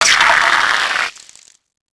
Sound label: coke can burst; Max Payne